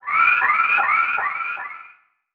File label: Multimedia Internet CD-Rom Flash Beep Multiple